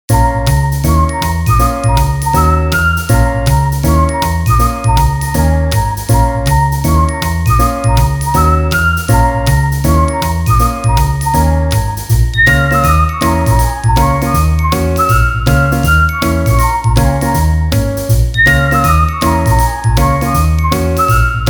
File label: Cat Jazz